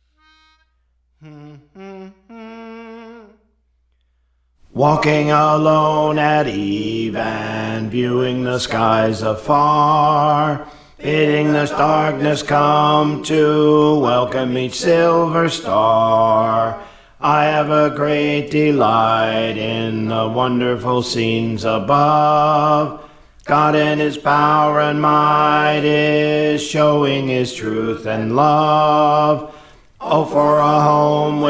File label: Bass; Praise For The Lord Training